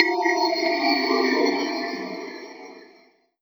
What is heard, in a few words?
Bell FX; Dance; SFX